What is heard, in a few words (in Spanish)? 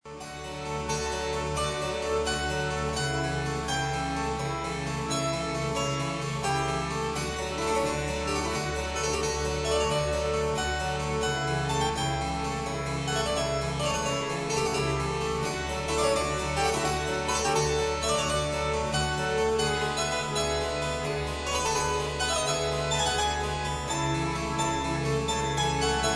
clavecin